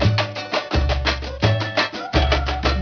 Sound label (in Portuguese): bateria; mocidade